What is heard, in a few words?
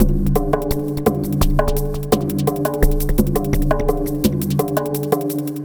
GLOBAL TRIBE GROOVE; Sonic Foundry (Sony Creative Software); Vir Unis Infusion